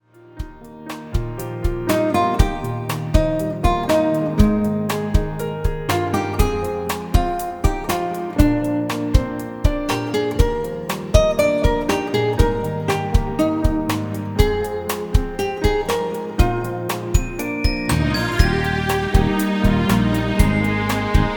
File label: shore